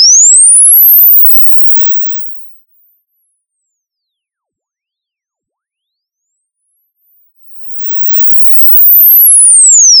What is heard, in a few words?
sine test